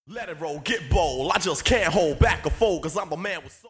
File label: Vocals ('I just can't hold back')